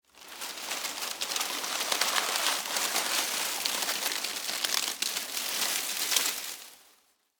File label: Foliage Crunch, Movement, Palm, Frond; Texture; Rustling Sound Effects; BlastWave